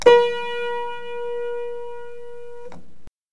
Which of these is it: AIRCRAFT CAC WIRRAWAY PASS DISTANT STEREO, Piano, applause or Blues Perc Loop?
Piano